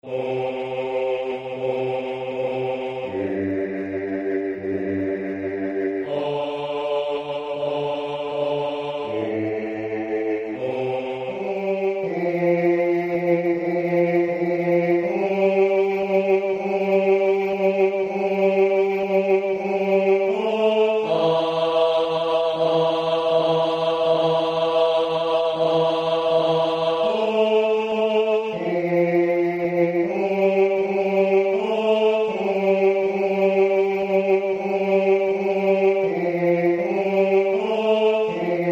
bas

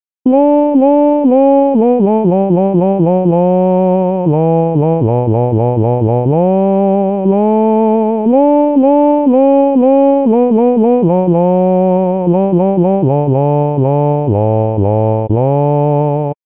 bassi

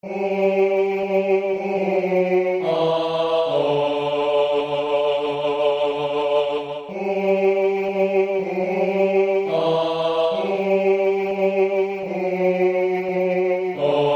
contralti; BELLE ROSE DU PRIMTEMPS

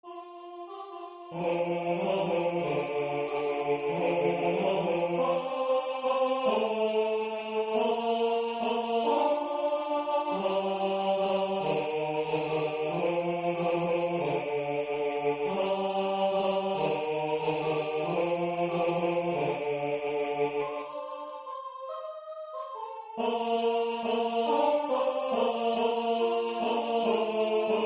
stille nacht tenori 3 strofa; Silent night

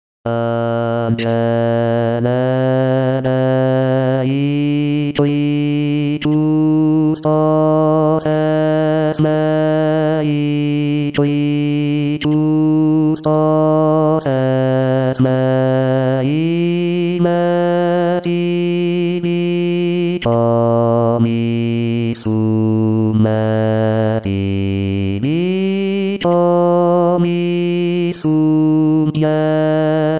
bassi; Angele Dei

